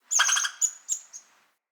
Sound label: Squirrel Trapped Call; Encounters; Animal Rescue